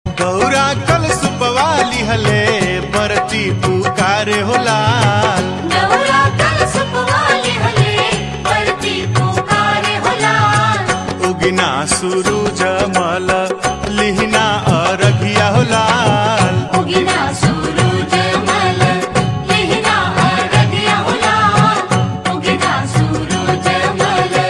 BHOJPURI RINGTONE

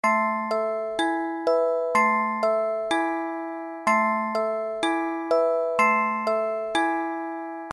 MORNING ALARM FOBA RINGTONE

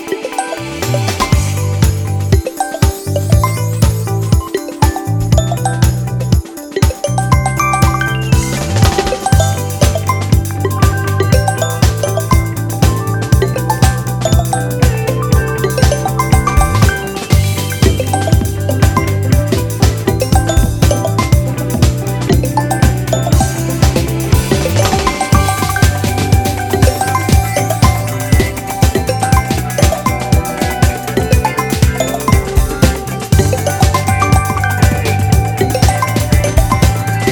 vivo phone ringtone

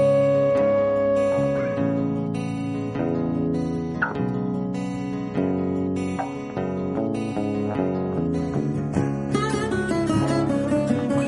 INSTRUMENTAL RINGTONE

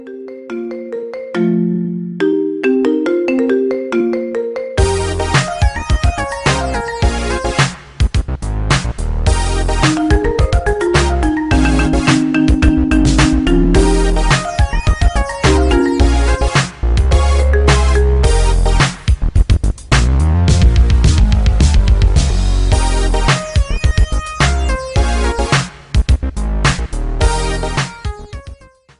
IPHONE RINGTONE REMIX

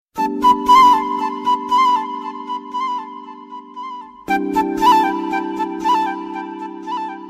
bansuri flute ringtone

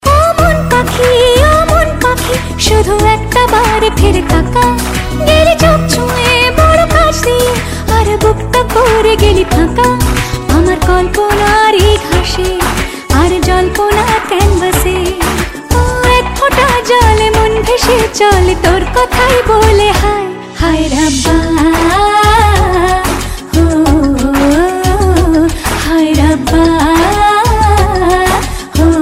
FEMALE RINGTONE

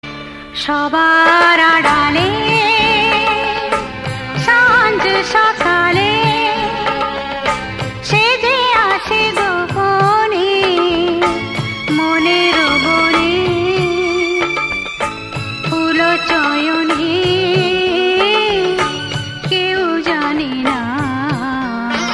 BENGALI SONG RINGTONE

